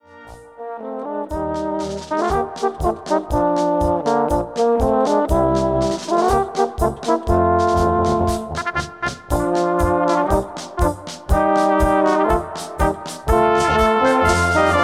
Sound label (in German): Kuschel-Polka